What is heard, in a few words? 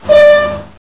Klaxon, Train; OpenBVE